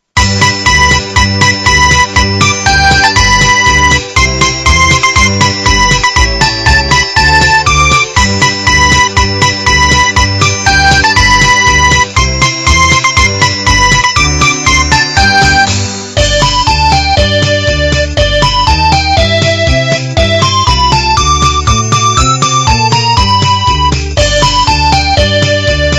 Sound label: Jingle Bell Theme